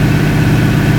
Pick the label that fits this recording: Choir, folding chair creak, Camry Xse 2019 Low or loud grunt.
Camry Xse 2019 Low